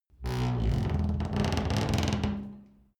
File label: wood door creak close